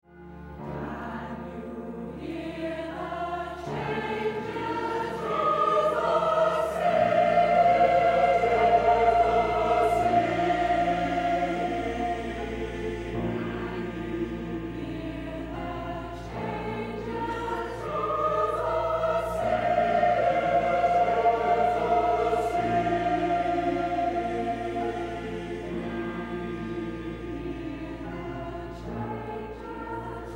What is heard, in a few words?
bell